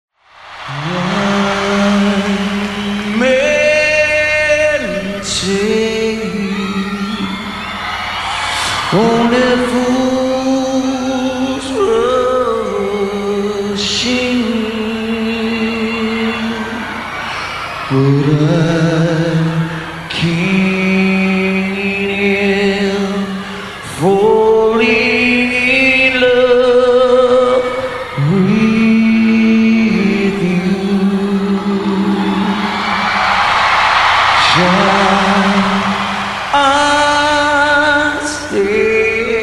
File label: acoustic